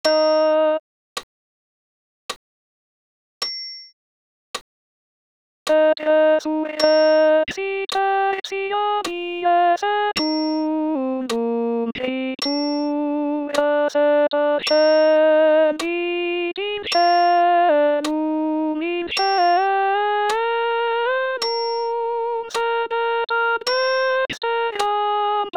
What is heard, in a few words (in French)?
Credo alt2 voc seul